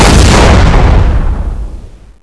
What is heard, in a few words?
explode; XDM